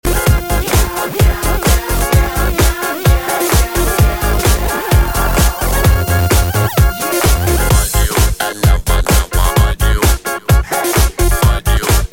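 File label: Electro Funk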